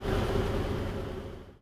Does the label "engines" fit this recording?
Yes